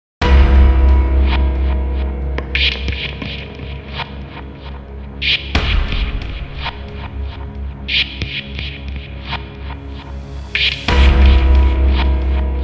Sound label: Impact; Corpse Party Blood Covered Sound Collection Volume 1